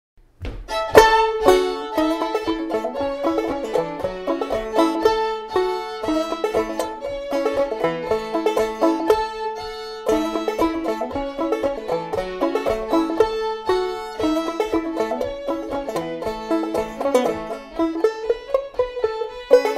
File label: Fast